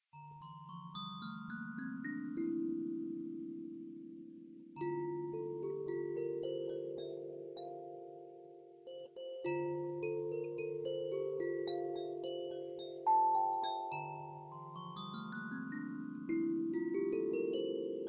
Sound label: Kalimba Dminor